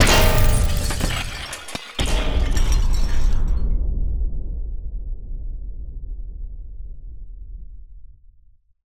falling metal debris, Bomb Blast Explosion and Debris Sound Elements; Bluezone